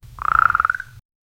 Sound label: sound of a frog, croaking